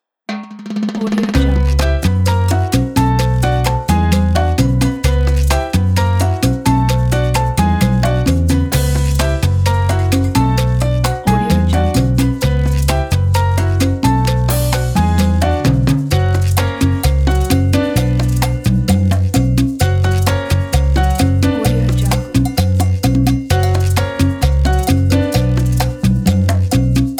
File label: latin podcast